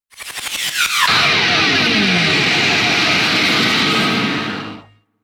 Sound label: Megaphone Laser